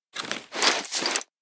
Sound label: naked, ledge grabbing Vaulting; Ledge Climbing Mantling